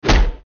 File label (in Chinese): 击中敌人